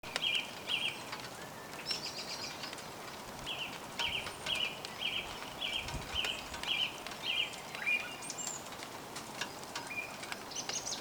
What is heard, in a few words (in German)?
Singdrossel am im Garten